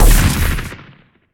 Sci-Fi Weapons-Assault Rifle-Single Shot

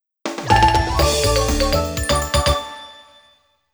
End Jingle; tokimeki memorial 2 substories leaping school festival

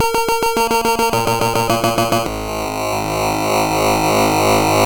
Game Start; Punk; Gottlieb; pinball

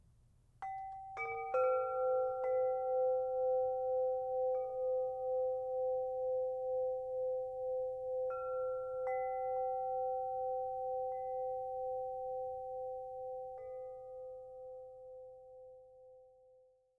Wind-Chimes; Littleboot; runic rampage

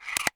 Drag & Drop - Drag

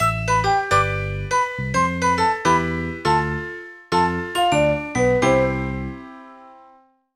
Music Lose; Tales to Enjoy The Ugly Duckling

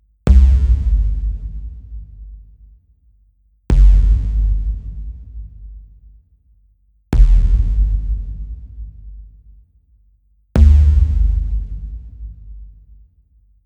Bass

